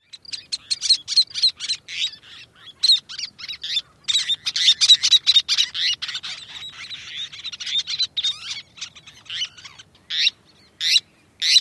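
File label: calls at nest, bird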